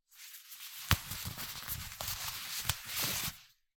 ジーパンのボタンを止める音

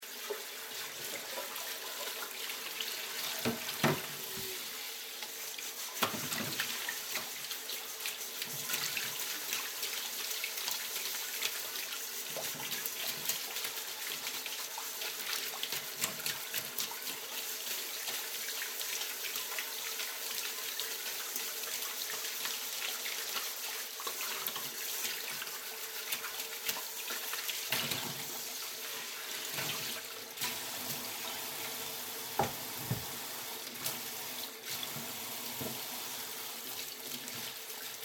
洗い物をしてる音

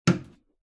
壁のスイッチを押す音; switch

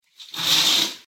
レースカーテンを開ける音; curtain

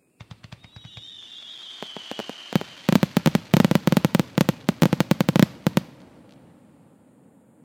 花火の音 連射; firework

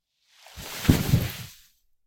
布ソファに座る音; sofa

